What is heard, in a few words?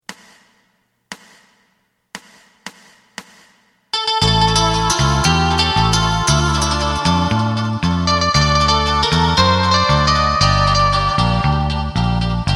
drum